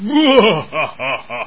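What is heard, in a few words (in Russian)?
жуткий смех одиночный короткий мужской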